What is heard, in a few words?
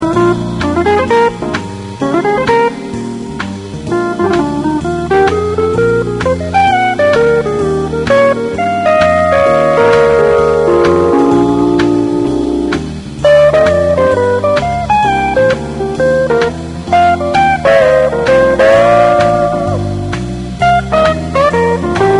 Blues To Use Solo